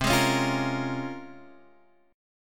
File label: Bb Minor 7th C; Bbm7/C strummed